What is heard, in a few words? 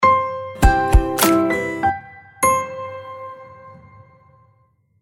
logo; CAVIMAC